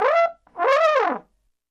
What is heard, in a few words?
Trombone Wolf Whistle